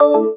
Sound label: klong; speech; tts; bmw